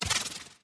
teratoma bounce medium

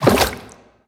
little jump; blastocyst

